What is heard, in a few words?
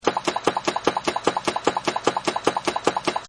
Toy Engine; Toy Cars; Toys